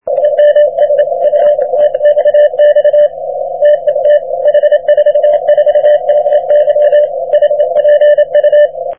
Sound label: 40m cw